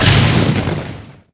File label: Blast fire; HereticII-Demo; Weapons